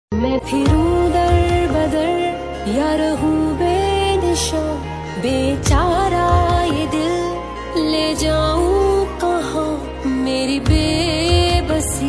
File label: Ost pakistani ringtone